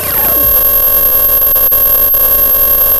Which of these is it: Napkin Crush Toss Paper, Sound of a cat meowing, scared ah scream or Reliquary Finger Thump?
Sound of a cat meowing